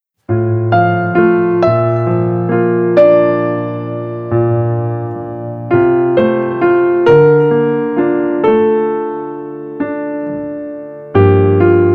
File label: karaoke soprano